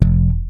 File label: SLP POP F, PEDULLA BASS; Masterbits CLIMAX 7 - Bass